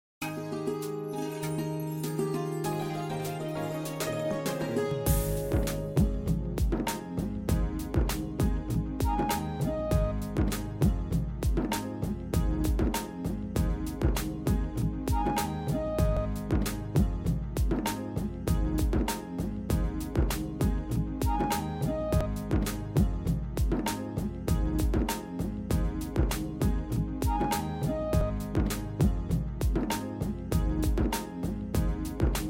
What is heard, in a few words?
instrumental; Audio Branding; LiFE Logos